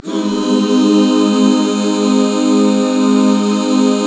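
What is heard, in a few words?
Ab major, back chords; Sound & Vision - Gigapack II CD 1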